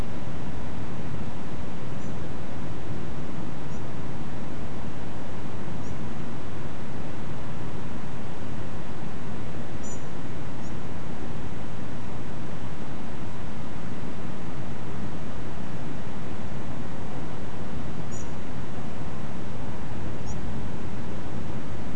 unid series of calls two parallel calls at c6kHz and 7kHz, nocturnal flight call; nocturnal flight call project in beijing autumn 2021